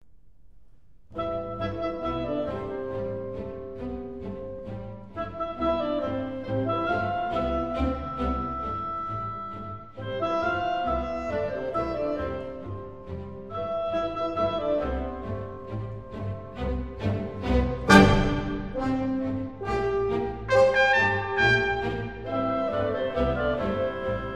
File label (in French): Suite petit orchestre Balalaika; Igor STRAVINSKY